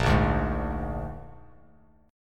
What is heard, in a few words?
Bb 7th Bb down Bass Standard; strummed; JGuitar